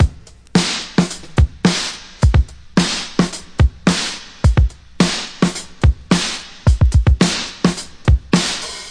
classic brk; DRUM LOOPS and BREAKS; VIBRIS CLASSIC BREAKS

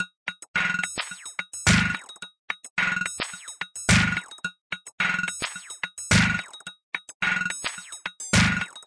bleepyrock nokik, 108 bpm, drum loop; 101 to 110 bpm

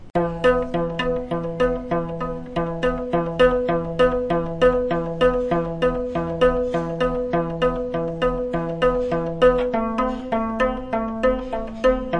ethiopian baganna melody; WORLD

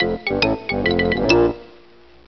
Xylophone Bassoon Riff

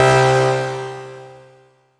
Synthesizer Middle C Notes, Lead

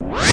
spin up

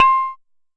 Jomox MBrane, drum machines; Jomox MBrane 11

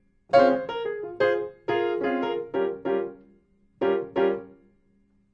chill piano 90 Bbm Eb7; KEYS ORGAN PIANO

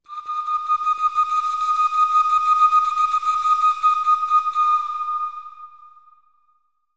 native flute; Flutes

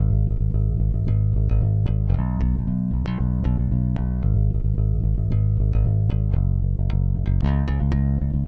modern rock bass

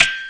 clangy bell; Western and Latin Percussion; single hits

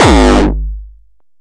distorto speaker ripper kick; Kicks; single hits

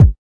kik

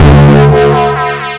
Evil Bass Sounds, bass hit; multisampled; Desecrated Productions

